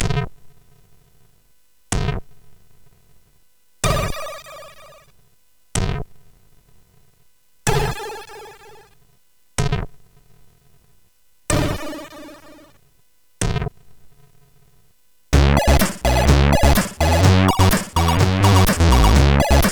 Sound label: Hemsk, sid CSG8580R5